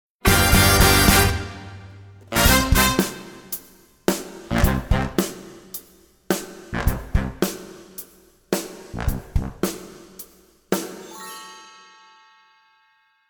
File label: Playoff